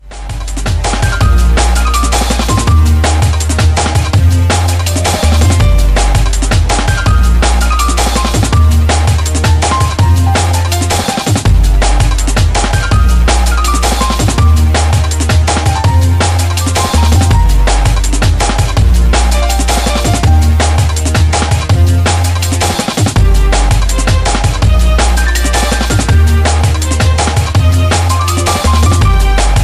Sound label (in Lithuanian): Muzika